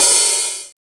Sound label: HH, Dance Mix